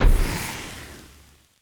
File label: onager hit ground